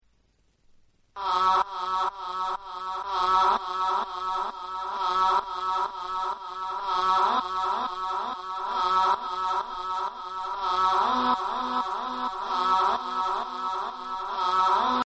Acapella

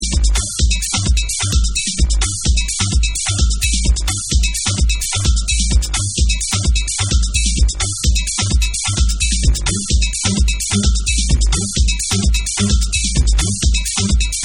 JAZZY, Instrumental

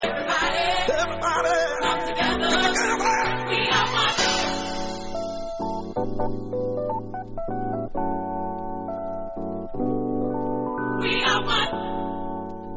Rhodes A Pella

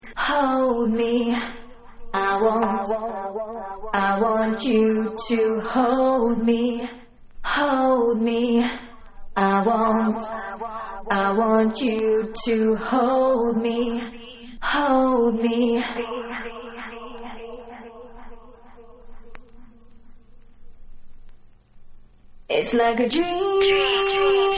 Acappella

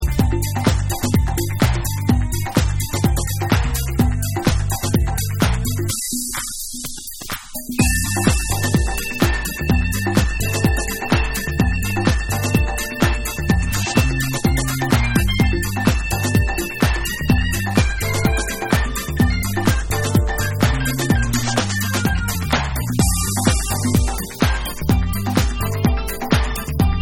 Organ Mix

